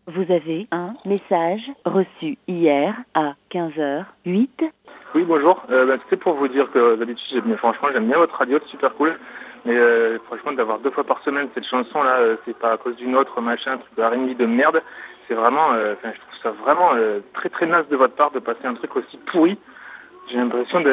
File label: JINGLE rnb